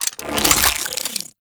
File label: Tonfa Unequip; Crp Tonfa